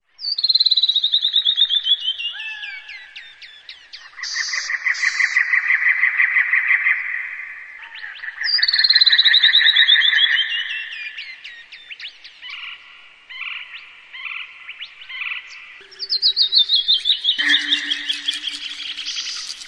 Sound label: Insect Sounds In Nature